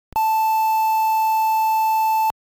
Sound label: cutoff, LSDj Wave Synth